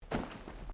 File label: backpack